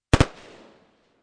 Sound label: M16 2 Burst Field, Assault Rifle; M16 2 Bursts Field Fire; Assault Rifles; Guns; VRSonic